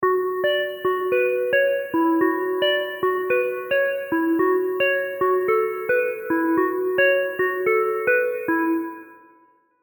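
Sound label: B minor 110 bpm, Ambient & Downtempo MIDI Melody; Mirage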